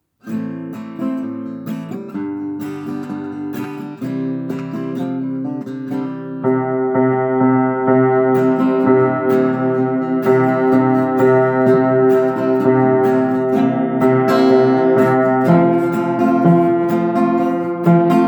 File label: Bass Piano